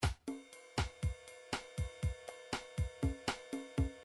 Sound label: drums bossa, midi drums; Midi Drums Drums 1of6